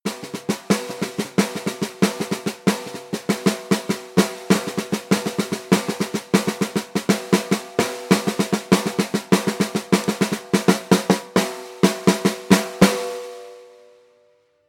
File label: caixa drum improvisation; percussion; loops